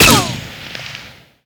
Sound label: Shoot; Bouncer